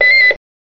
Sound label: Beep up